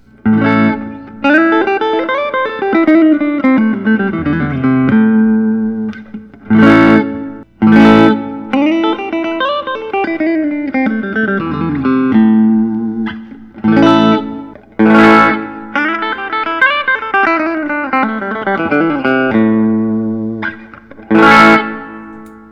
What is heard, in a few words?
gibson custom chambered 54 les pa